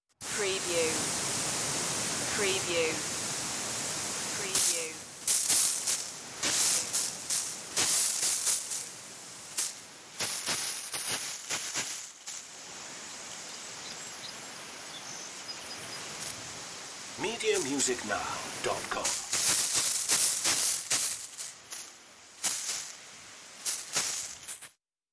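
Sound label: Garden Hose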